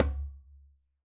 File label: djembe Single Hit Left Hand Bass; Raw Perc One Shots; Slate; SD SEISMIC Drum Sample Pack